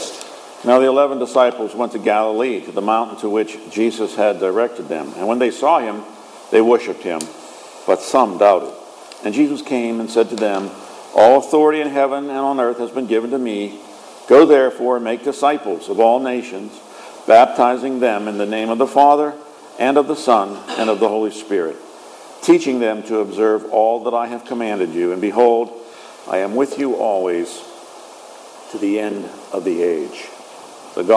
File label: Gospel